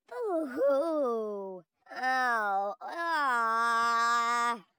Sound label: Woman Booing; voice; Zomboid; Lifestyle